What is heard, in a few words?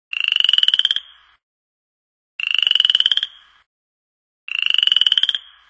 frog small